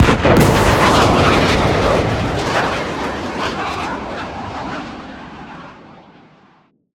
combat drone jet texture sonic boom; sonniss gdc

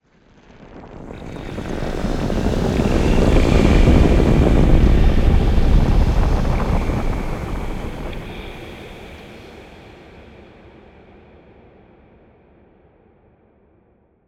cinematic transition; bluezone; sonniss gdc

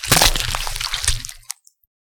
zombie massacre head smash; corpses

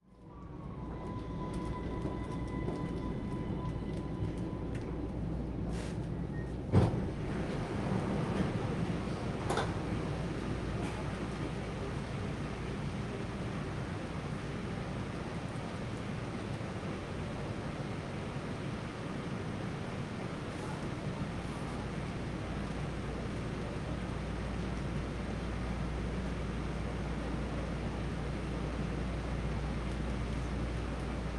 ambience austria vienna int day subway ride; sonniss gdc

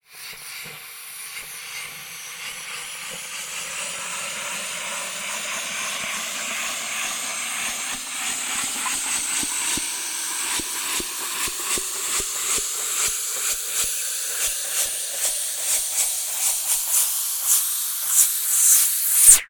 hose upward suction; fvtt sonniss gdc audio

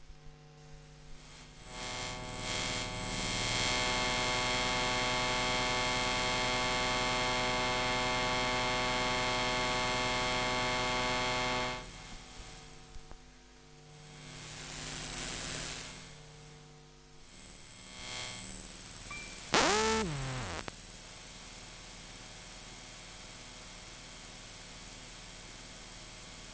xbox 360 hiss and ejecting cd; sonniss gdc

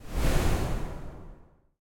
swoosh; baileywiki maps premium towns

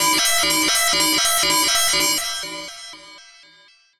trepidation; sonniss gdc

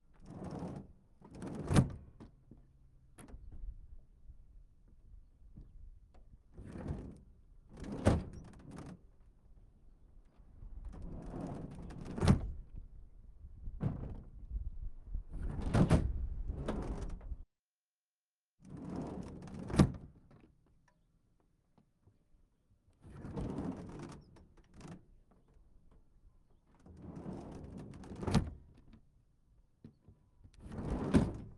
corsair canopy; mkh418

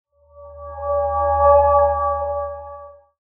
dancing lights, cantrips

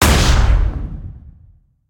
sci-fi hit; sonniss gdc

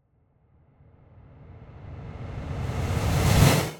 whoosh transition; sonniss gdc

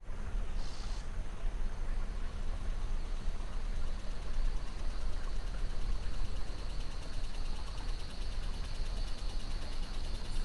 jungle costa rica am insect chatter swells river; holophone; Sonniss GDC

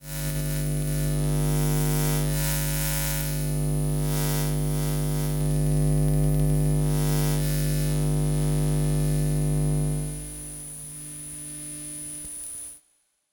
electro magnetic interference; sonniss gdc

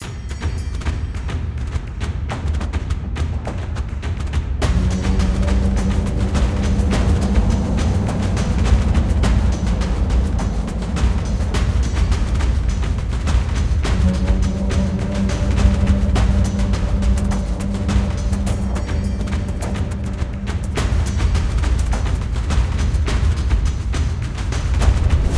ready as can be 208bpm loop; dynamic-soundscapes; forest ambient

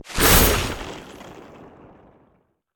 magic spells impact ice; sonniss gdc

